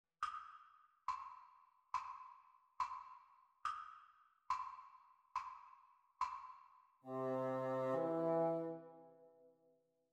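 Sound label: bassoon duets practice speed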